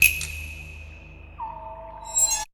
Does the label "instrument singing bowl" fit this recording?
No